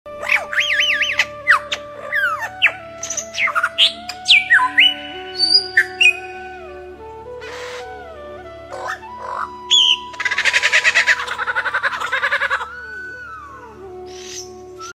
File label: Menura, پرنده